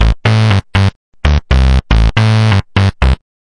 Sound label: 119 boogie hardsynth bass